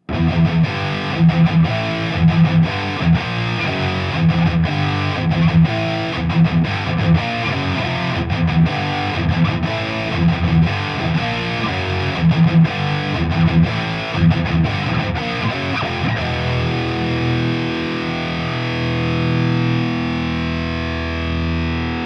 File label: vh4 pre comp stereo